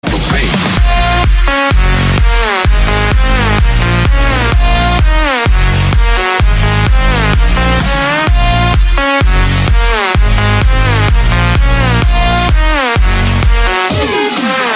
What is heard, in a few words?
House